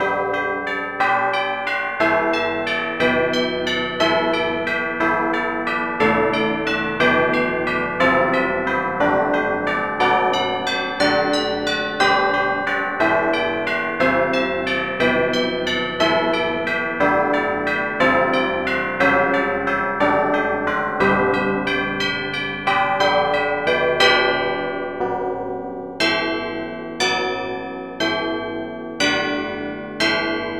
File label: tubular bells